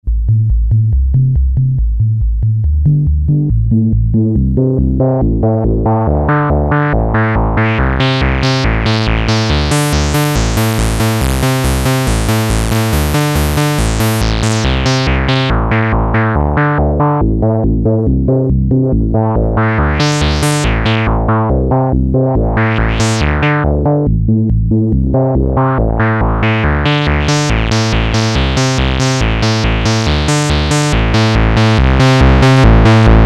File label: Moog Realistic MG-1 Filter